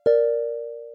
harp; phet-io-wrapper-sonification; build-an-atom

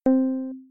simple Pickup; balloons-and-static-electricity

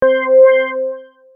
Rotation; molecules-and-light

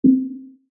Bright Marimba

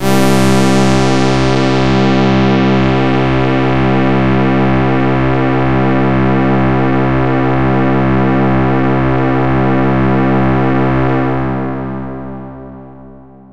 Glow 5ths Detuned Pad; molecules-and-light

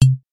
boundary Lower

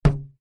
Bump Into Mass; gravity-force-lab-basics

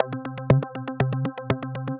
Current Bass Bell; phet io wrapper sonification; ohms law

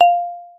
marimba F4

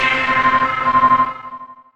Float Away ending; molecules-and-light

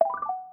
Button Partial Sim Reset

